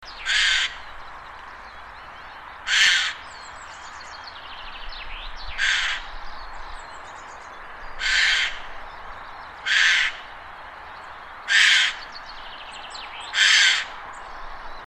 Garrulus glandarius

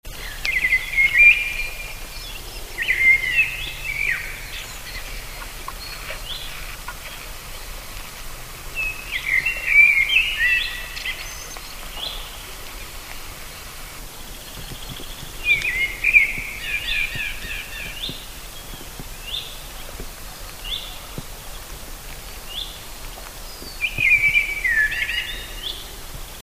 Turdus merula

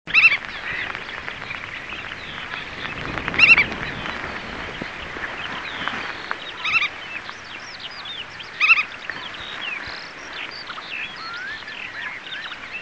Limosa limosa

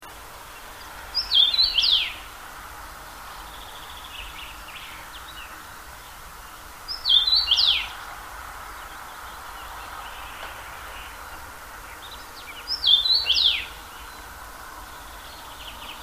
Carpodacus erythrinus

